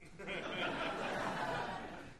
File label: Crowd 100 Short Medium, medium int